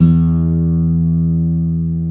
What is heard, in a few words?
GUI AC. E, Ac. Guitar; Sound & Vision; Gigapack I CD 2; GUI ACOUSTIC 1